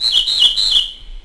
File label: birds karelia; World of Tanks Blitz